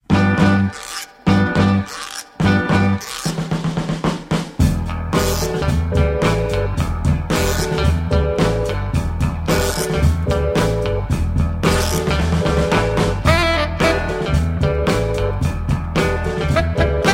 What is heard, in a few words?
mod jazz